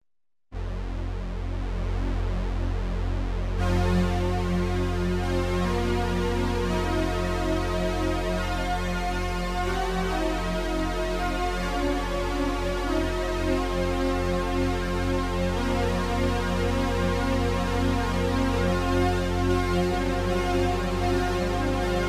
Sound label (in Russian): sintezator melodiya medlennij temp; volshebnoe predvidenie